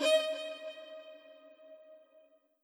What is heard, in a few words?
violin